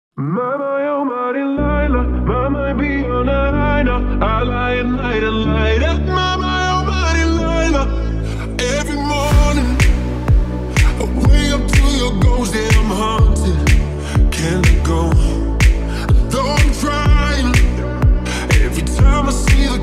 remix slap house

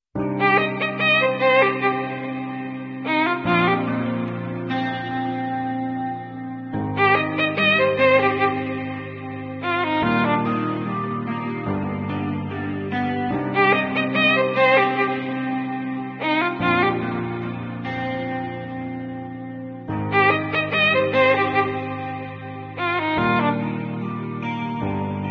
hindi song; bollywood

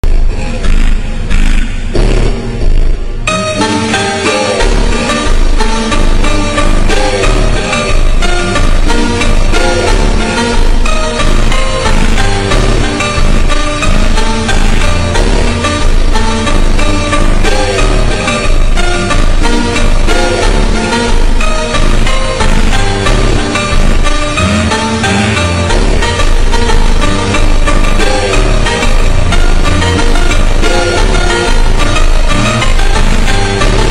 super slowed reverb

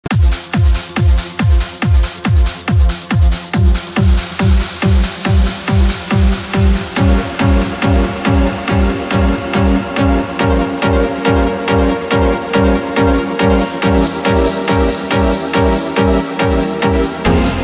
trance remix; antonio vivaldi

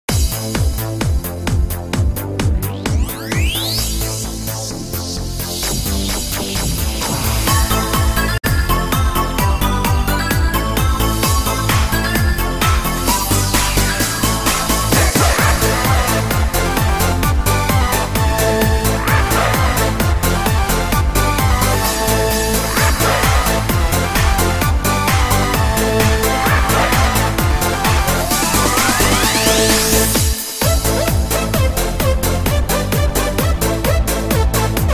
instrumental music remix

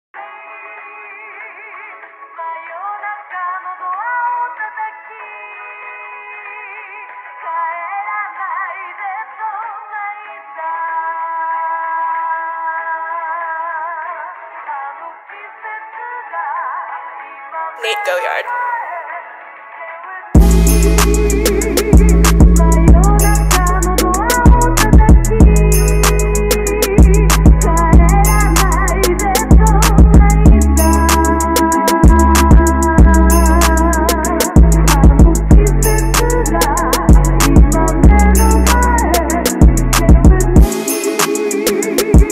remix soft bass boost

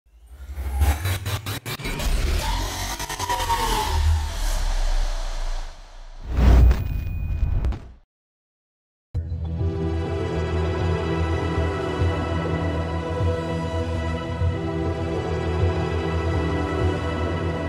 epic motivational rap beat hard uplifting type

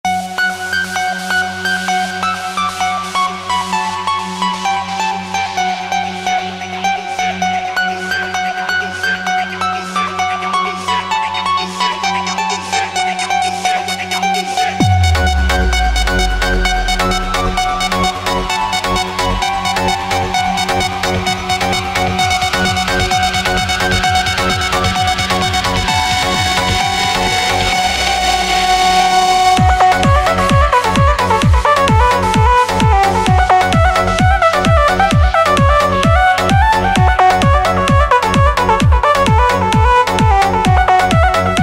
clubremix party edm dance

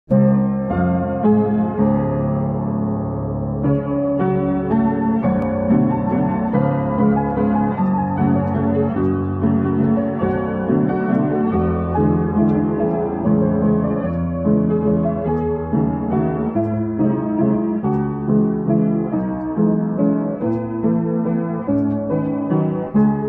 distorted piano version slowed reverb

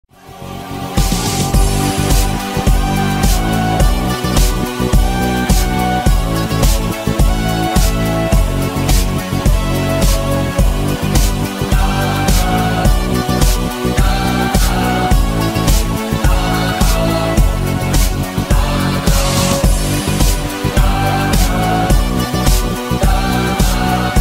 sped up instrumental

